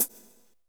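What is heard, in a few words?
hi-hat, drums; Best Service; Real Mega Drums VOL-1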